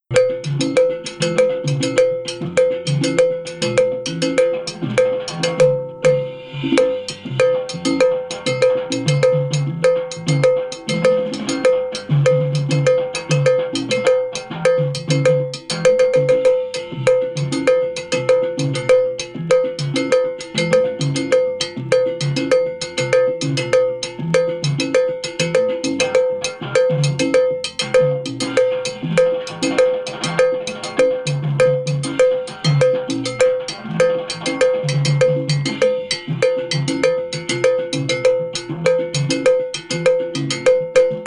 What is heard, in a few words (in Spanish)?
Cencerro